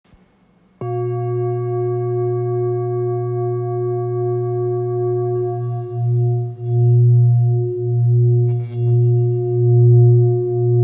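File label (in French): Bol fullmoon grave fleur de vie la diese, bols chantants tibétains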